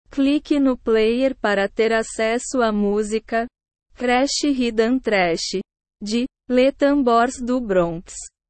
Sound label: crash rythm thrash